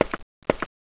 Giggle Walk; Seer; GamigoNA

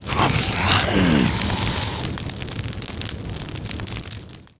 Fire die

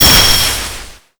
Windy Witch Attack

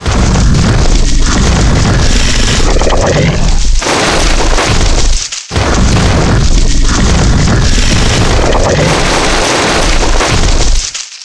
sandbug

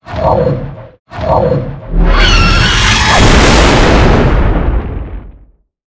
Dragon Skill

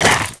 Skel Knight Dmg

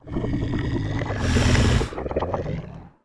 Goble King Idle; Seer; GamigoNA

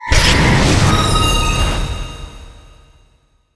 Heal; EmuClient

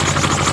Steam Run

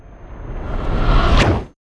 Stone

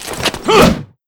Spearman Attack

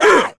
Orc Captain Dmg

